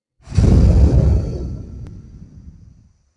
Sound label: screamer jumpscare; custom my fnaf